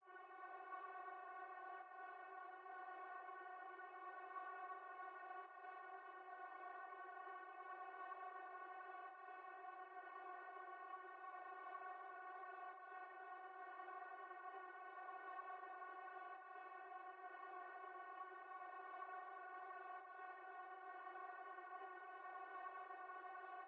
132 BPM F# Min Drone, UK Garage; Melodies; Loop Stems; Cymatics; GHOST (Beta Pack)

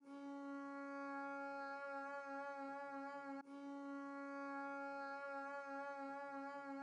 141 BPM F# Min Strings; Loop Stems; Cymatics Gems Vol 7; Gems Free Teaser Pack; Jump Up

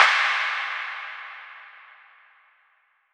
Impact FX; Cymatics; Orchid Sample Pack

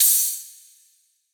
Crash, Hip Hop Drums - One Shots Cymbals; Cymatics - 9God Hip Hop Sample Pack

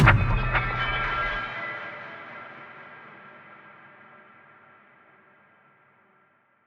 Portal

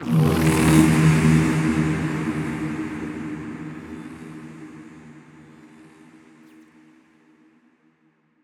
Atonal Depths, Psytrance

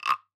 Guiro Wooden Frog; World Percussion; Cymatics; Emperor World Percussion

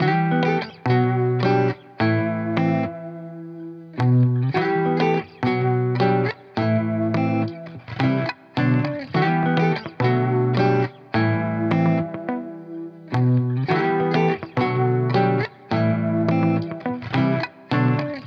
105 BPM E Min, Guitar; Frisbee; Cymatics; Miami Guitar Pack - Pt 1

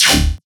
Riddim Bass Shot; Dubstep; Riddim Basslines; Ghosthack; Advent Calendar 2019 Day 17; Riddim Bass Shots

